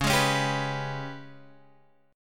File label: G sharp Minor Major 7th Double Flat 5th